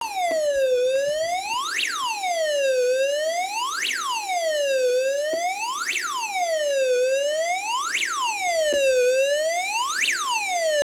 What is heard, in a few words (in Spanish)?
tono